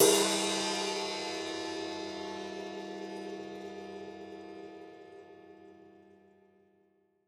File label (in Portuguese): Prato De Bateria